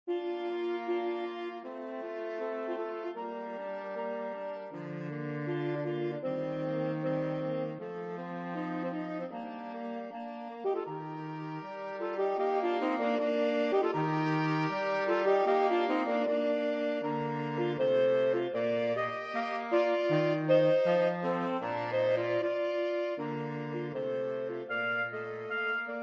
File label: Quartet in B flat Andante; Mozart